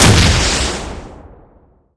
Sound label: rocket, weapons; EK modern; Barotrauma